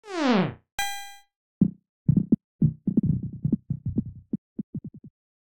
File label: Unused Jingle; Dr Mario